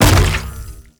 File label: Mushroom Bounce Impact; Warframe; Nokko